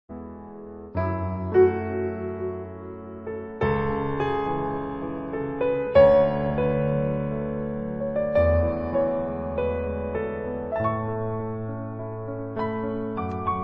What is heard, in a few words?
Live Piano Concert; Commando